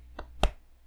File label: tap tap